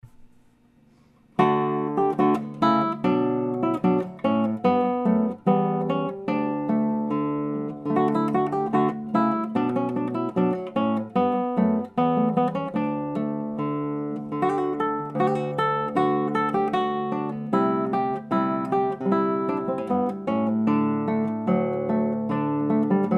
Kemps Jig, classical guitar

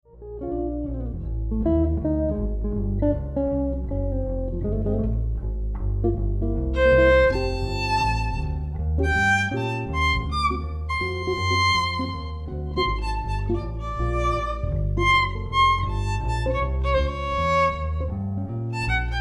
Violin Guitar Bass Trio Jazz Sample

